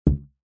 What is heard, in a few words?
rubber band large ping twang thud